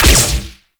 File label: Flak Explosion Small; Warframe; Eximus; Blitz